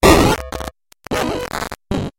game glitch

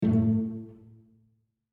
1 note mid pizz

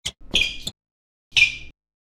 basketball sneakers

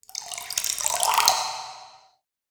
water swell; soundscrate

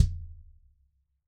bass drum

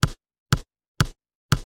basketball dry dribble loopable

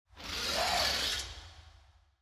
designer scifi mechanical servos; SoundsCrate

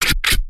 Stylized Gun Reload; soundscrate

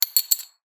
coin drop; SoundsCrate

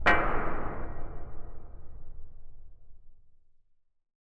Echoey Metal Hit; SoundsCrate

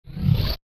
Machine Charge Up; SoundsCrate

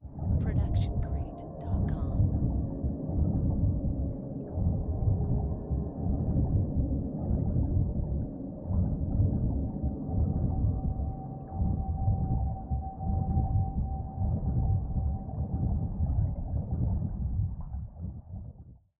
underwater ambience; soundscrate

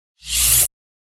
lightsword turning off; soundscrate

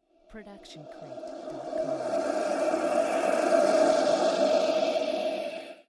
horror rising ghosts; soundscrate

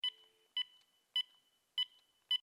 Phone Dial Beeps

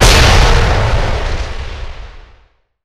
explosion boom; soundscrate

